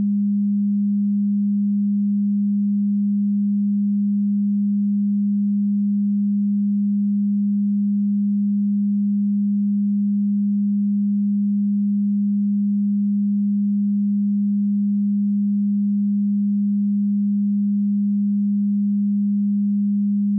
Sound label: 200Hz -16dBFS Clean Loop